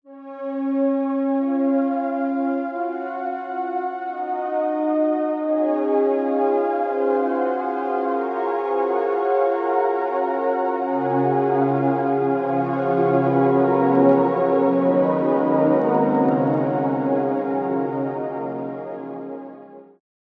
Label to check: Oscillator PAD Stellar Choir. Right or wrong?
Right